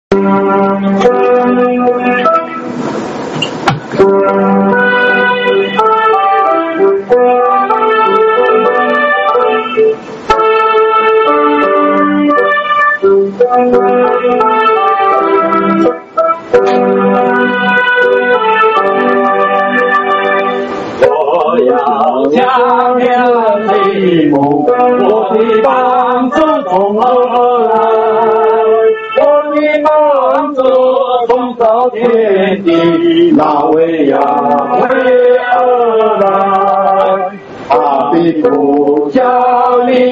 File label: hymn; feast 2013; tabernacles succot; 1st hymn